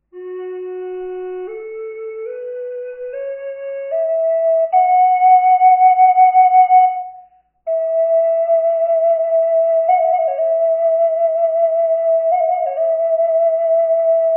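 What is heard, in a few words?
reverb, flute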